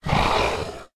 cerdo exalar

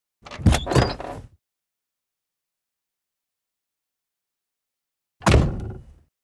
ABRIR FURGONETA; yesterday origins gamerip 2016 switch ps4 pc ios macos

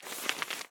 Pagina

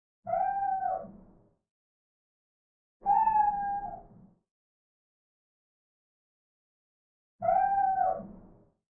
fondo gatos

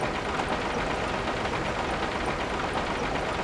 mecanismos fuelle

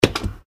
Nevera abierta

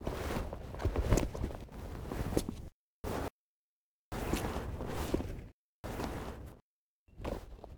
BAJA PUENTE

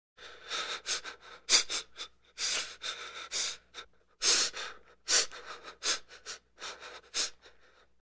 RESPIRACION AGITADA; Yesterday Origins

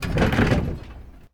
SFX RUEDA BACHE; RUEDA GINES BACHE

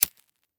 ENCENDER MECHERO

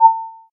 Interf Interrogación; yesterday origins gamerip 2016 switch ps4 pc ios macos

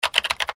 Teclas

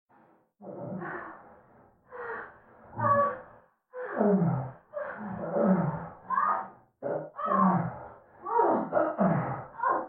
fondo gemidos

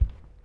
Paso descalzo alfombra; Yesterday Origins

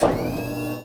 plataforma movimiento inicio

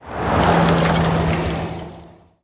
SFX FURGONETA ENTRANDO

